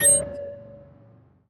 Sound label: new Menu Select; unsighted